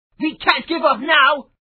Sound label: gameover; operation graduates